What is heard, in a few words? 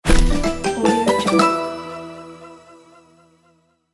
win logo